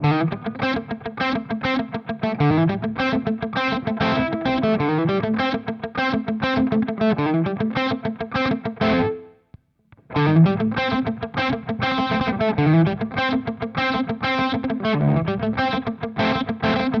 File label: Space Charged V2 Tight Fat; Crazy Tube Circuits